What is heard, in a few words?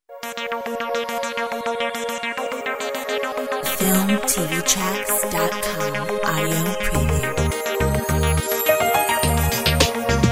Uplifting Inspiring Royalty free music